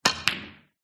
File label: sport snooker cue ball strike hit other ball reverb room